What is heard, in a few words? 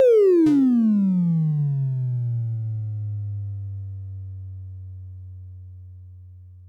Big Disco Tom, techno drum fx; Single Hits